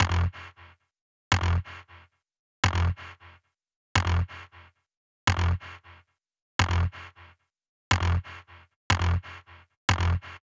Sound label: Synth Bass; Basses